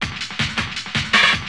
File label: DRUMLOOP D+B 160, Drum+Bass Loop; eJay